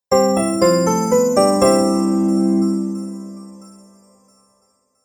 Game Award Logo, Video Game Sound Effects

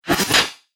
Broken Droid Bits, Robot; Robot Sound Effects

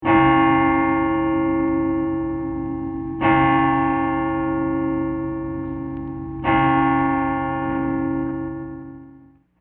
Deep Three Strikes, Clock; Clock Sound Effects; Clocks (Sound Effects:Machines)

